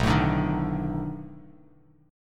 B 7th Sharp 9th, down, Bass Standard; strummed

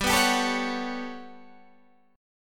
G Major Sharp 11th G down, chord; Listen to GM#11 strummed

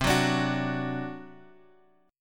C Suspended 2nd Flat 5th C down Guitar Drop D

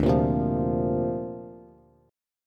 D-Minor 7th Sharp 5th D Bass, chord; Dm7#5; strummed

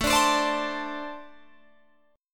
A-Minor Double Flat 5th A Guitar Open G

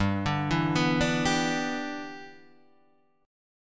G 6th

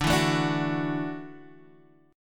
Gb Minor 7th Sharp 5th, Guitar

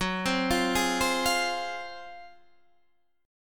F Minor F sharp, Fm/F# chord; arpeggiated; JGuitar

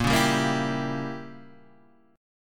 A sharp Diminished 7th A sharp; A#dim7 Chord {6 4 5 3 5 3} strummed

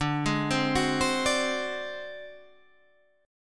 G sharp 7th Flat 5th D, chord

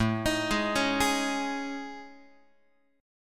A Major 7th Flat 5th, Guitar; AM7b5; arpeggiated; x,5,6,6,5,7

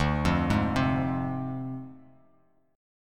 D Minor 7th Flat 5th Bass Standard; Dm7b5; arpeggiated

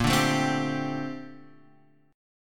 A sharp Major 13th A sharp; A#M13; strummed; JGuitar

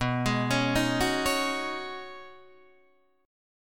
B 6th Add 9th B Guitar Open D, chord

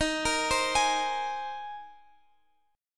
Bb 7th Suspended 2nd Suspended 4th Eb

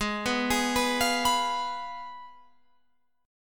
Ab Minor 6th, Ab, Guitar, Open F; Abm6; arpeggiated

